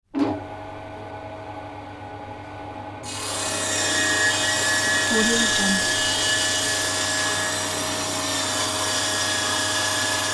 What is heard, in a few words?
circular saw cuts wooden board; hollywoodedge